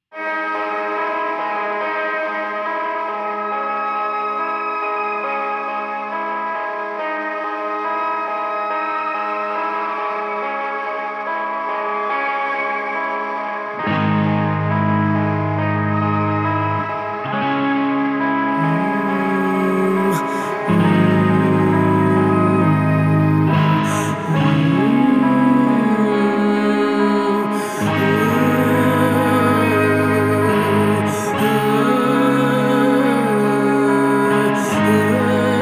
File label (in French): Narcisse avec Gtr Mix